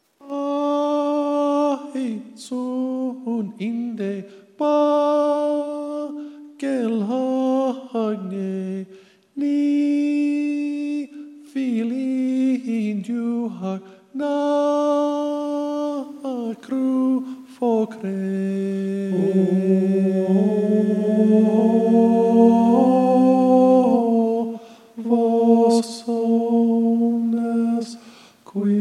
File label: Tenebrae IV 1st Bass; First Bass 2; Tenebræ IV—Men; Lent/Holy Week 2013 Rehearsal recordings